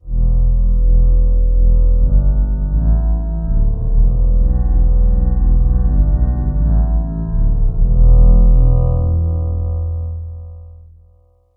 metal pad bass

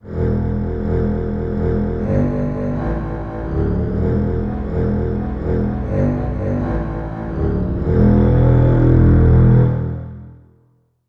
slow strings bass

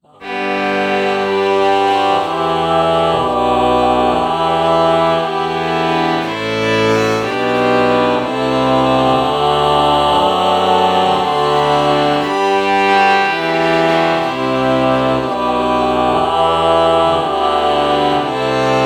ah violin morph

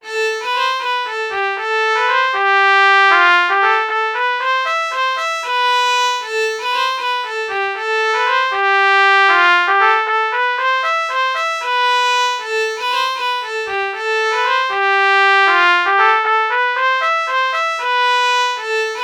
violin trumpet melody morph

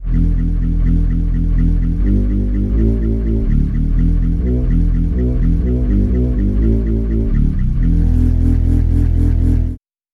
flute bass

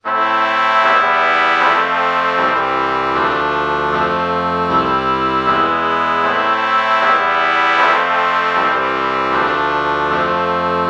oboe trumpet lpc false chord morph

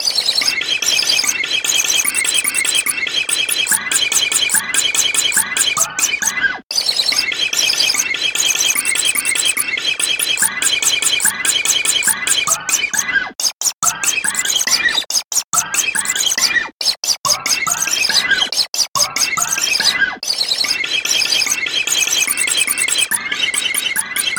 fret noise

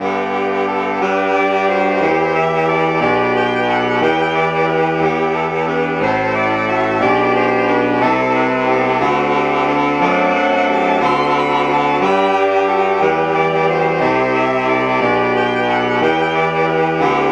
alto sax

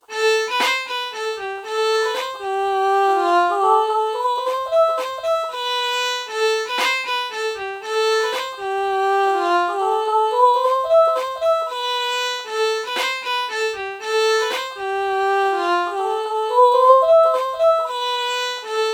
ah violin melody morph

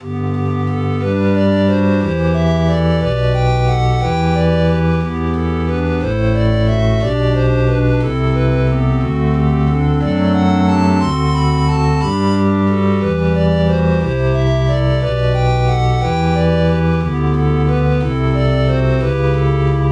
pan flute

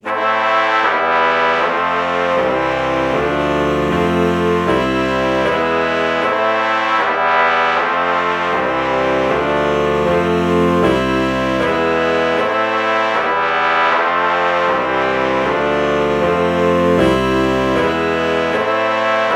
alto saxophone trumpet chord morph

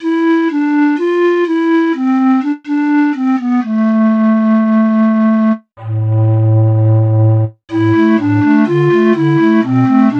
pan flute

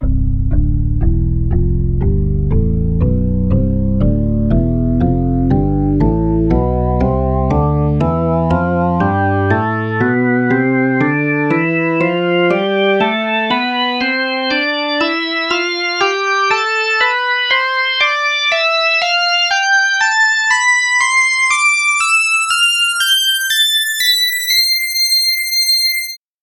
rock organ; tonleiter

